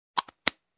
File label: Plastic lid closing